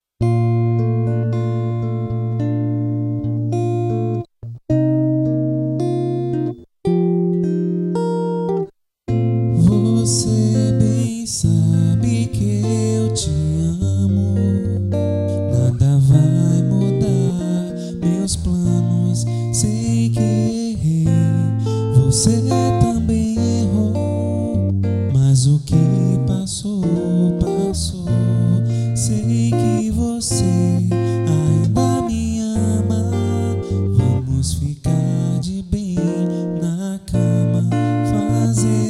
nova historia voz violao; Voz e Violão

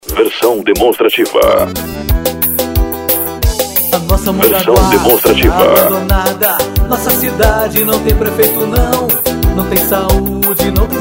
jingle pisadinha de qualidade forro; Jingle 2020 Vereador E Prefeito; Renovação Politca Eleição

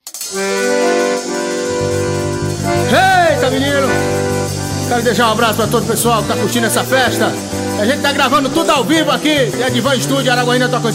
abertura, acordeon

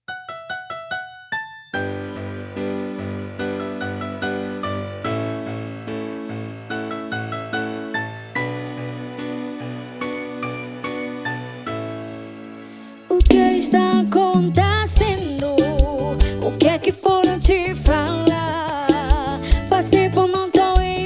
forro romantico

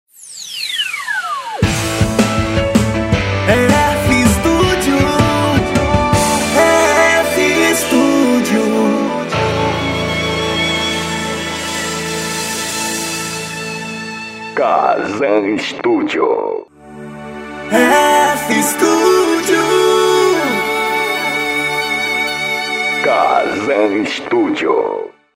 vinheta

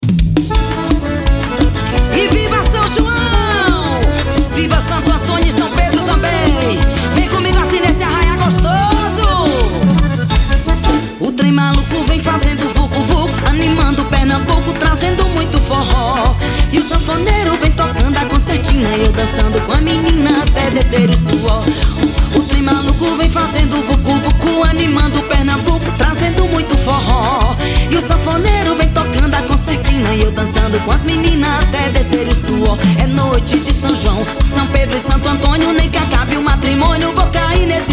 arrasta pe do forro

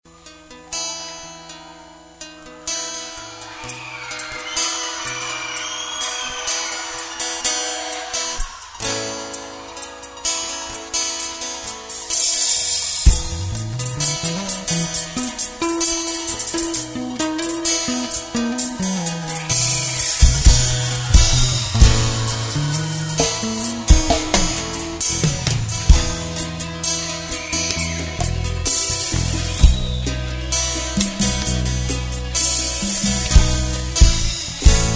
instrumental

